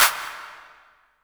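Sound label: claps, JV-1080 drums; EdgeSounds; Drum Mashines VOL-1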